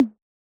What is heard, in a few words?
Tom, Yamaha DJX 2B